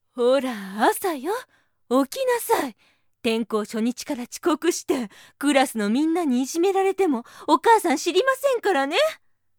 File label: haha